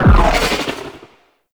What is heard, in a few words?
Ultimate Focus Activate; Ultimate Abilities